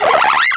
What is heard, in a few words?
cry; source of the sovereign